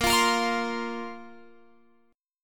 Bb Suspended 2nd Bb down Guitar Open F; Bbsus2 strummed

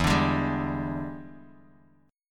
E 7th Sharp 9th E down Bass Standard; strummed; chord

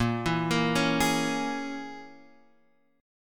Bb Minor 7th Flat 5th, Guitar, Half Step Down

